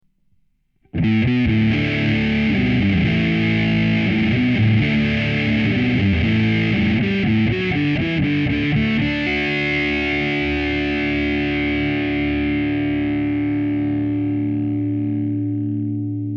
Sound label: fuzz, guitare